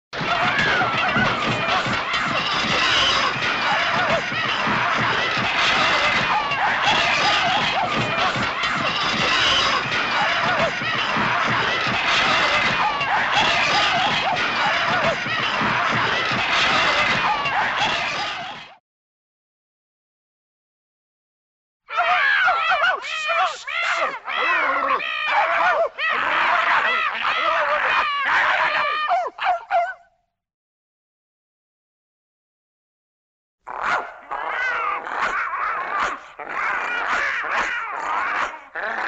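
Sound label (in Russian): драка собак с кошками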